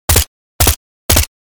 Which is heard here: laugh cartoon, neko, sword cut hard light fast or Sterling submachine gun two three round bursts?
Sterling submachine gun two three round bursts